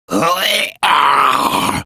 Death, Barks; Kuva Lich; Persona D

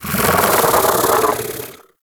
Bug Vocal Alerted; Characters; Warframe; Hunting Critters; InfKDriveBug

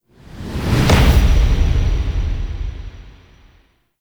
Transition Stinger In Fast; Warframe; Tau

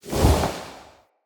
Staff Caster Cast Short, Weapons; Player; Staves; Galene

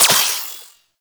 Pistol Shot; Guandao

